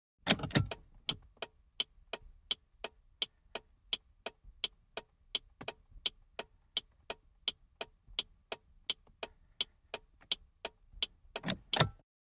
Montero hazard Light, 1998 mitsubishi montero int.